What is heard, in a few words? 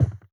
Wood Foot; Zomboid 41.78.16; Project Zomboid